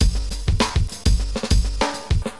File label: DM LOOP, HipHop, Drums